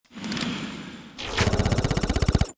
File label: Skill Buster Shot; starbites